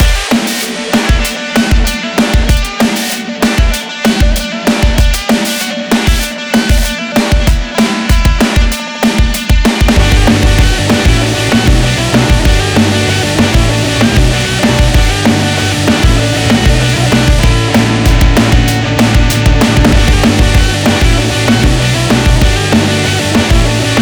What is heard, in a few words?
emo pop punk; DAEC#E